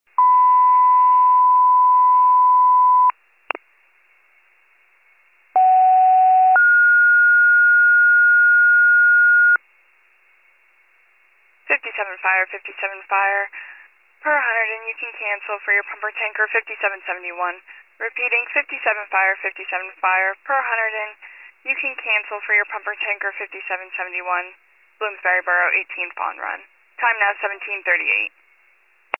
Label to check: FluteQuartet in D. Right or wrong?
Wrong